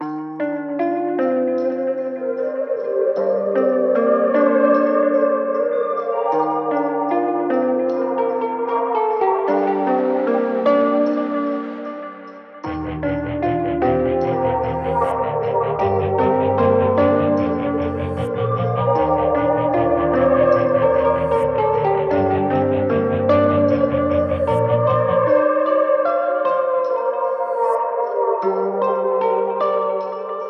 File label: Layered Gm 76bpm; Autumn Vibes